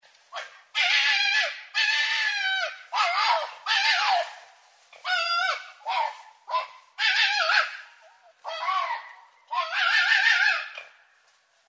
renard

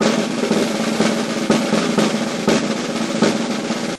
Roulement tambour; Cirque

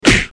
Coup de poing; Coups de poing

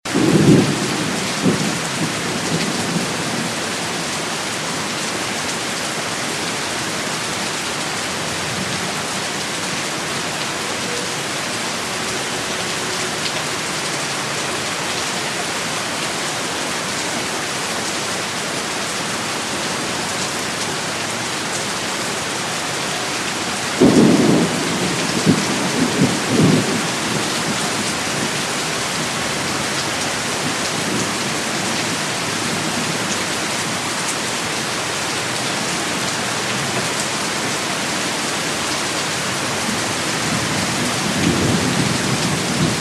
Orage pluie forte; loop

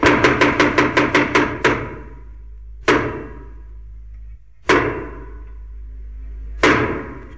Theatre coup avt leve rideau

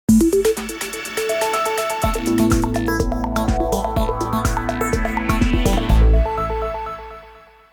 Flash Info link; Jingles medley

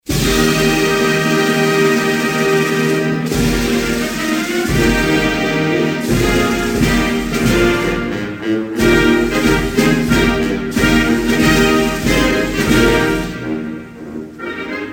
Soudan, Hymnes nationaux

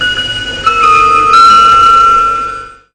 SNCF Annees 70

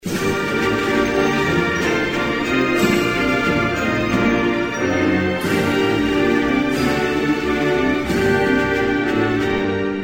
Ukraine, Hymnes nationaux

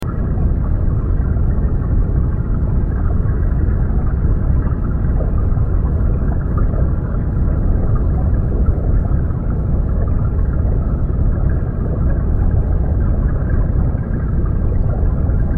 Mer abyssee

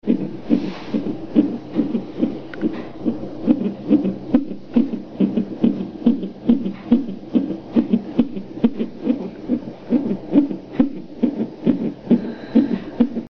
Battements de coeur

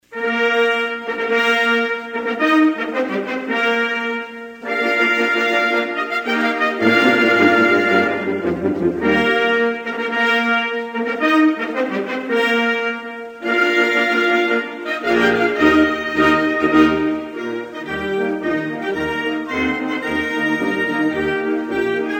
Cuba, Hymnes nationaux

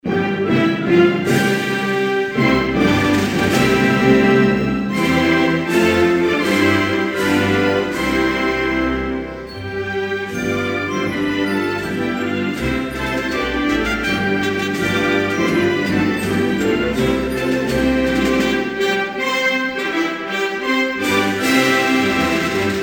Esthonie, Hymnes nationaux

